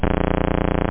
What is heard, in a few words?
BUZZ; OctaMED SoundStudio V1; rave